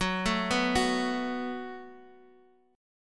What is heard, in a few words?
F sharp Minor Double Flat 5th, Guitar Open D; chordshape; down